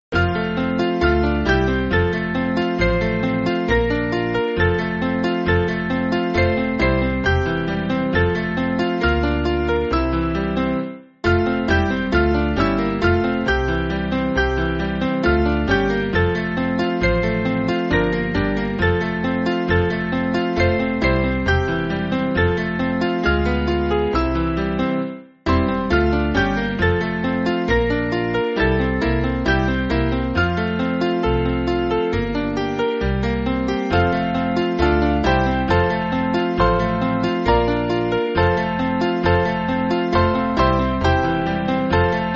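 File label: Piano, Backing Files for Churches; Upon The Cross; O Welt Ich; Mainly Piano (CM)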